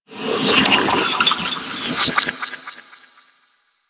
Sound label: Bark; Warframe; Teralyst; Vomvalyst